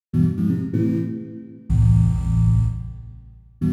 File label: DEEPHOUSE ORGAN 125 A